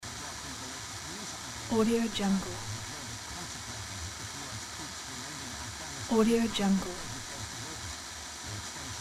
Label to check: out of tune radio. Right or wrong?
Right